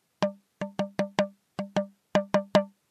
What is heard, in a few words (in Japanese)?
kosi tutu drum